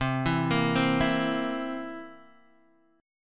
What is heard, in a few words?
A sharp Suspended 2nd Flat 5th C